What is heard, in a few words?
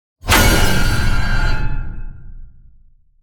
Horror accent jumpscare suspense short